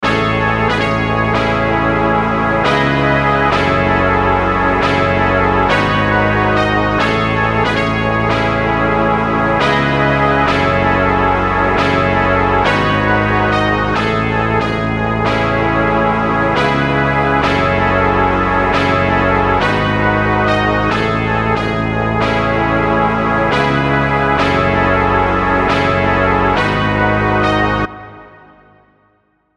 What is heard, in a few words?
Brass Loop C min 138 BPM